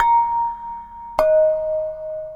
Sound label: Ceramic Bells; Ambient; Melodic; Ambient-Melodics